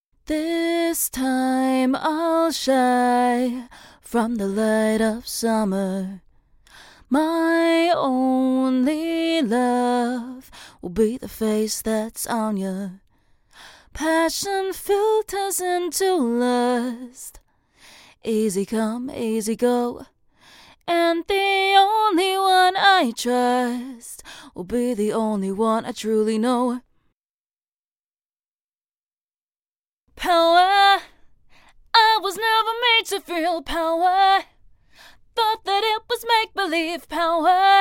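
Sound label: Pop Electronic EDM 140 E minor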